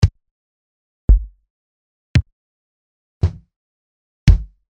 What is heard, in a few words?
Kick Dissected